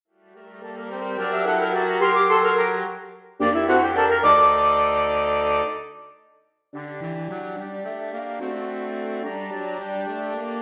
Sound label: Traditional Coventry Bells Ending